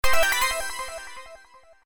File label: Bonus Win; slots; Wildlife Adventure